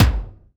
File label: rich top end steel kick drum a key; Duration 0:01; kick drum samples